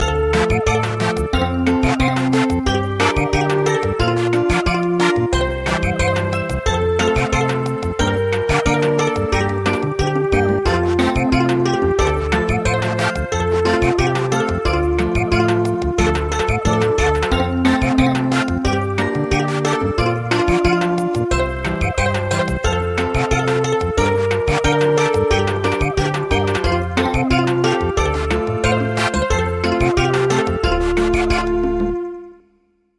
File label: Samba, midi